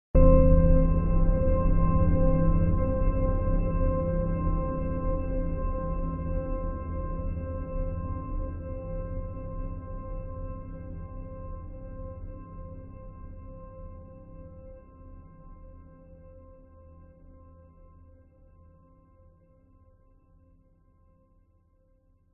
sound design mysterious tense serious sinister hit drone subtle